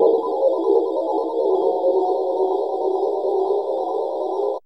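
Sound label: METAL DRONE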